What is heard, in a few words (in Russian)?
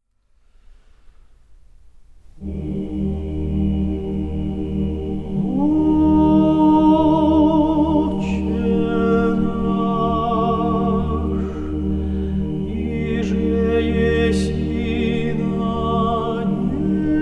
Хор Валаамского монастыря